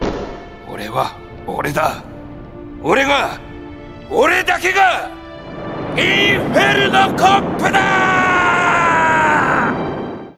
glitter

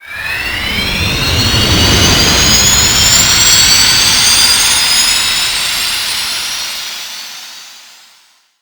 boost jet; sonic

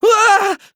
surprised; taichi

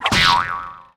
kaboing

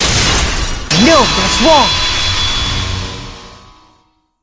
cross swords; makoto

